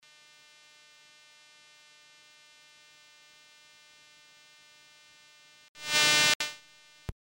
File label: hum only recorded video; sony hvr v1u